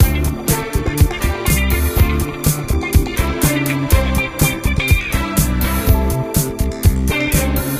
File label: Disco; Diner Dash Hometown Hero